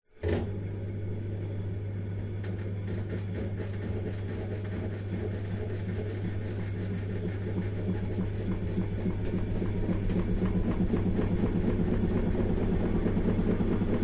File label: washing machine modern spin cycle; zapsplat